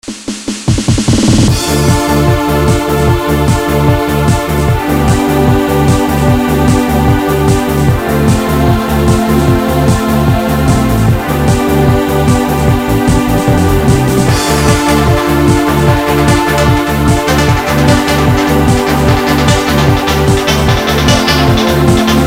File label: menu; msx; bomba